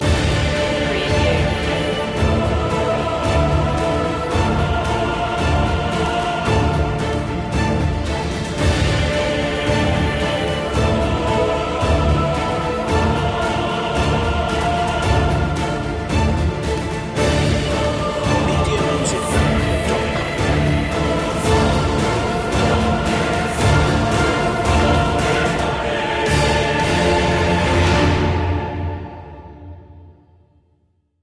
full mix

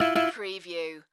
Casino Electronic Sound Two Tone

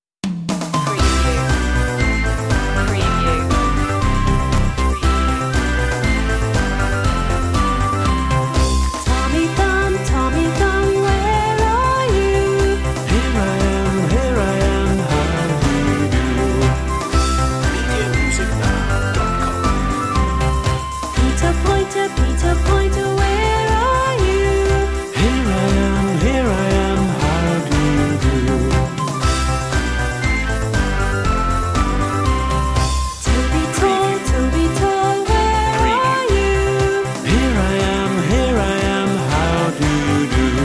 tommy thumb

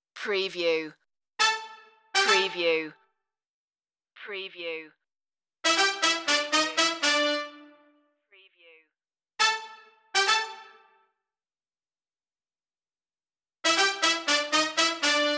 funk soul king loop

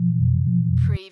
Space Scifi Sound - the lab

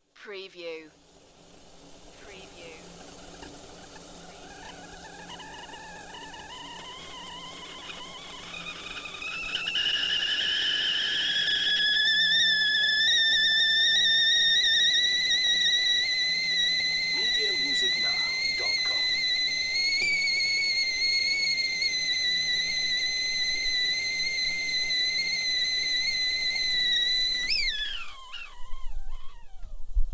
Kettle building up to a whistle; beanstalk audio

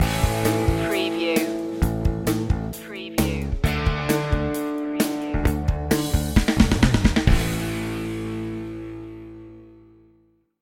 raise me up stinger

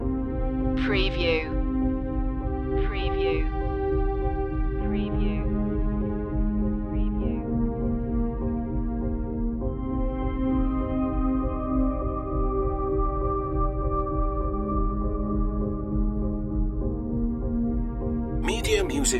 ion rise loop

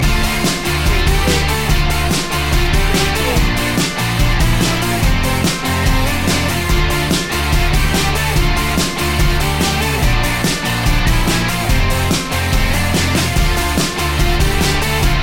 kicks loop

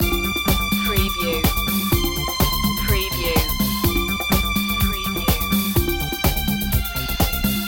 disco loop

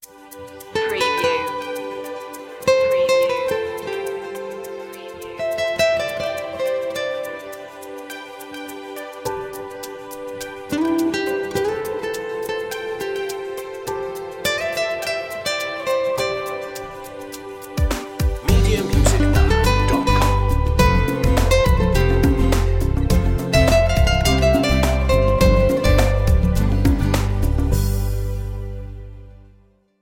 latin ways

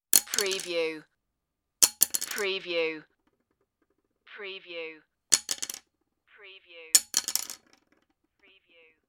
pencil falls on table

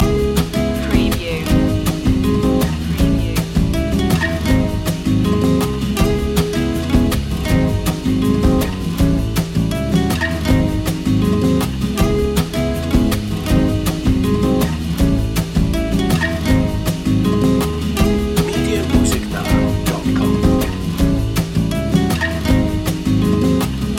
vibe loop

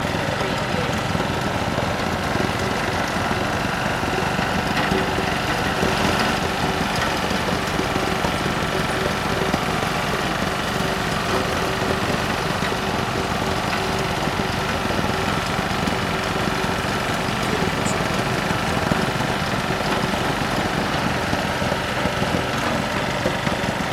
construction vehicle motor noise loop

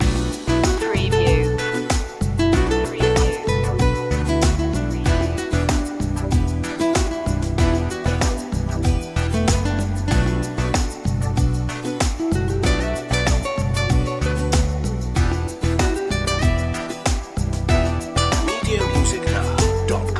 love rhythm loop

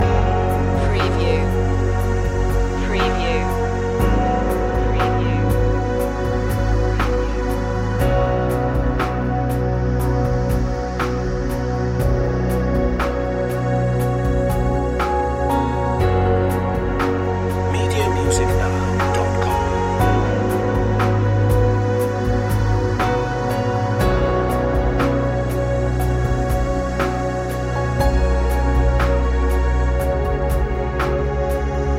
open universe loop

